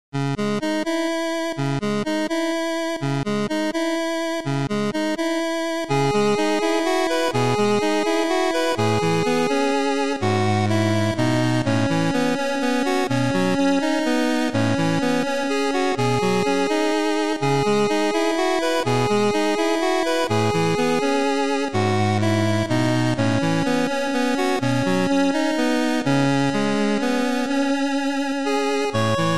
8bit

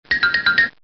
Notification Sound

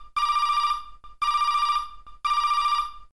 Cellular Phone

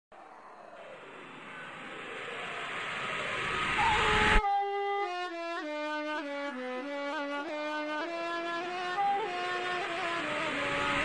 Arab Gangsta Beat

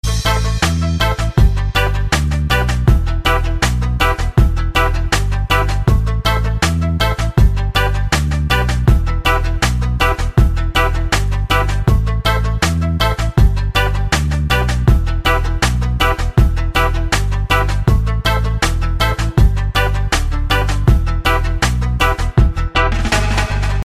Cool Reggae

